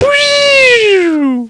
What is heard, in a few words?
Spin, bad vocals